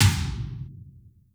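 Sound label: processed tom, drums; Crypton - DrumBase5000; PROC.DRUMS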